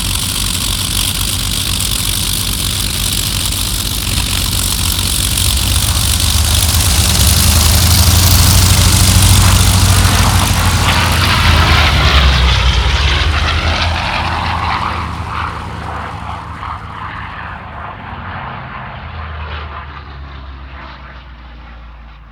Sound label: Stuka Take Off